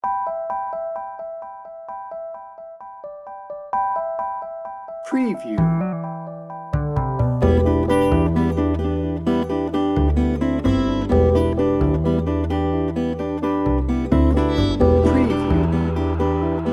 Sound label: breezy and positive no drums